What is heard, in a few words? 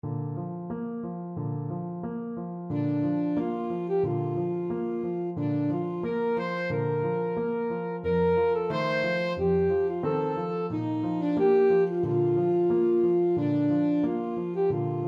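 aria alto saxophone; flotow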